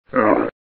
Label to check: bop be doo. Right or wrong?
Wrong